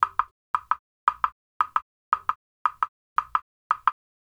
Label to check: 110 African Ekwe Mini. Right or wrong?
Right